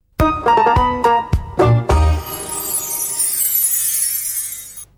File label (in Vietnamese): nhạc hiệu quảng cáo; VTV